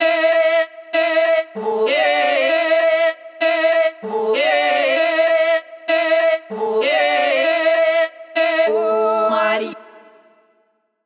Loop 97 Wet Tail A

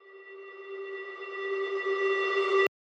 Dry 90bpm Reverse

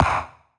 Misc Percussion

